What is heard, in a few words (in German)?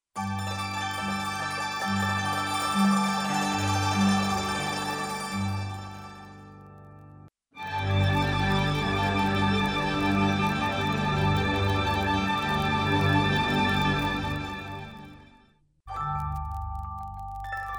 sparkle accelerating particles layer einzeln; NI arkhis